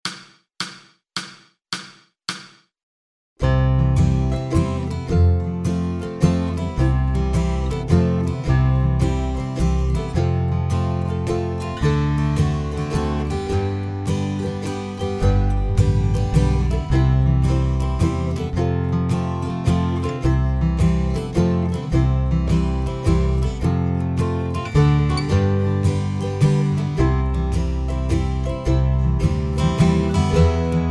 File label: grass rhythm